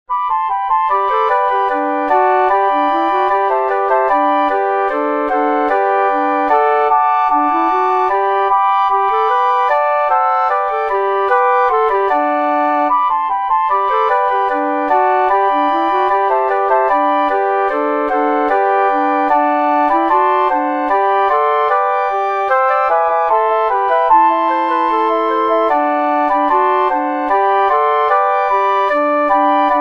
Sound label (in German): Querfloete Quartett